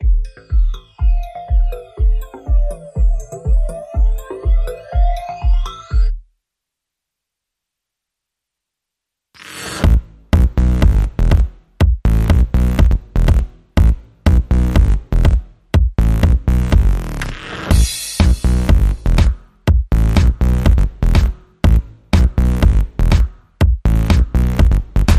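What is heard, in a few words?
drum and bass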